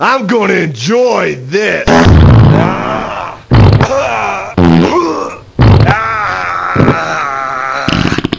fart

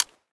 mag rel, mk18

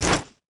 pwrench miss, weapons; eqclassic

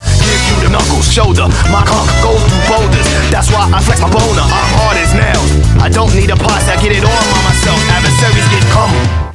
cuckles

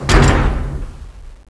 door stop

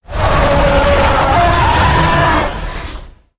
attack; sven; npcs; cthulhu

